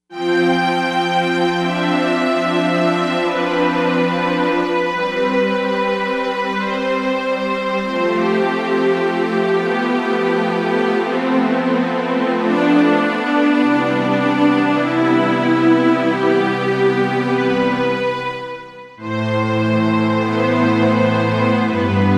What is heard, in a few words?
Strings